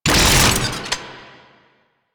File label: Beam End, Weapons; Warframe; Archwing; Larkspur Prime